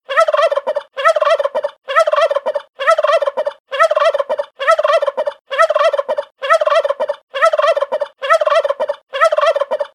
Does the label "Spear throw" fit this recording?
No